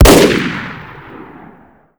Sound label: sentry powerful; snds jack gmod